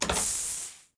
lever; interface

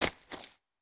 FS Mud, footsteps

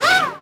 air wrench; Cars; XBOX

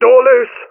CD DOOR, DAMAGED; Rally Fusion Race Of Champions; XBOX; ENGLISH

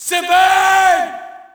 Shout, audience; UFC Tapout 2

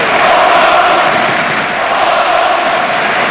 Chant, Crowd; David Beckham Soccer; XBOX

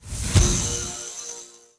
spawn; interface

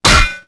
break pipe

